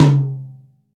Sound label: roomy tom drum c sharp key